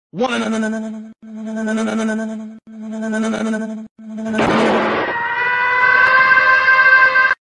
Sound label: mosquito Wanna nanaa